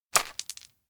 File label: konpeito hit toe; anemoi パッケージ版